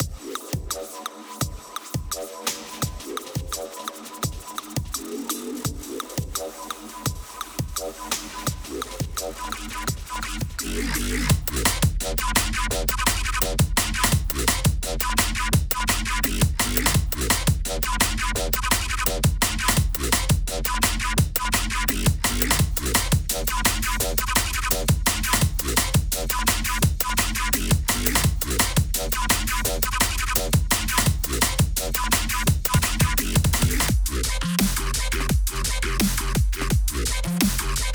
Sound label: Dubstep Boss; Cryptheim